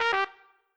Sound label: Instr Trumpet; Backpack Hero